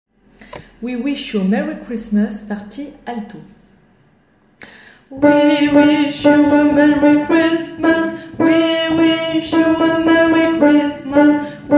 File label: alto; We wish you a Merry Christmas